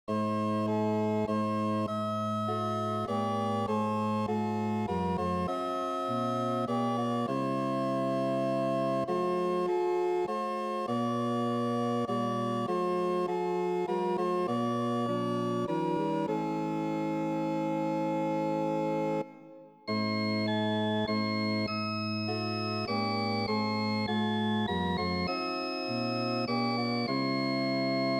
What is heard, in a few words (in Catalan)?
òrgan; Catalunya